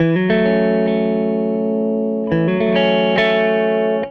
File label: Gretsch Guitar Loop